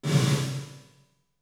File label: HALL